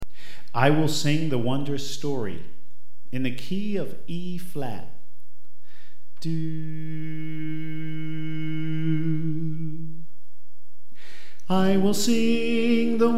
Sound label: Soprano